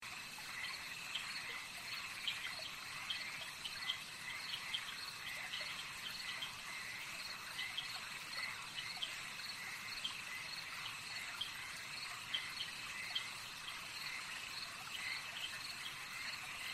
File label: Swamp; MAPA Zgniły Lasek